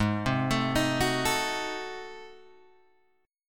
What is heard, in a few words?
Ab 7th Flat 9th Guitar Open G, chord